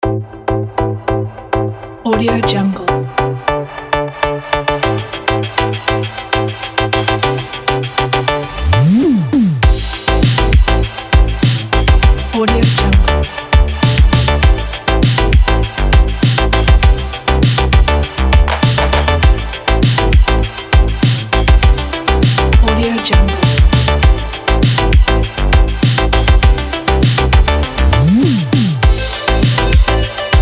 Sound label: electronic pop